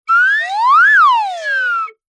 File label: cartoon slide whistle double pitch up down